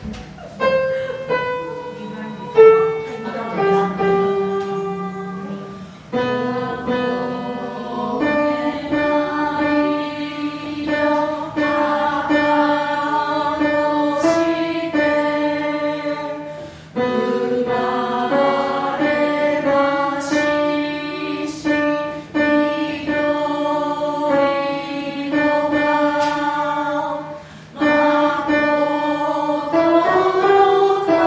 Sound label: alto; アルト